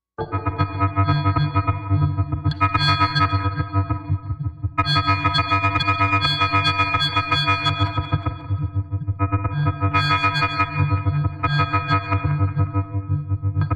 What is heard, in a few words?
Frayed Wires Machine, electrical